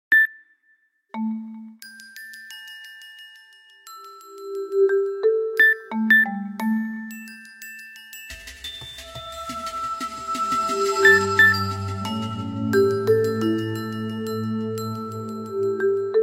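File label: original ringtones and notifications nokia 808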